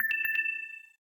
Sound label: Low Battery